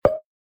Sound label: scroll Menu